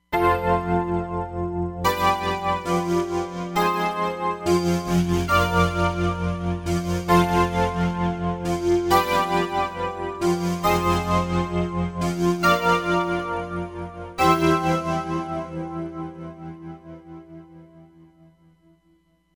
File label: Vint Clavier; Roland Fantom XR